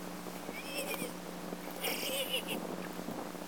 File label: Inia geoffrensis; Silver Springs, Fla. 3-Oct-1956